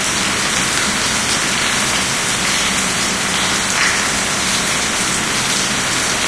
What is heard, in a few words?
int rainverb hard loop, rainscapes; video game; Left 4 Dead 2; Source Engine